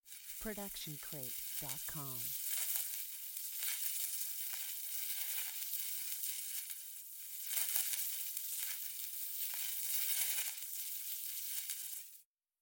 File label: Magic Portal; SoundsCrate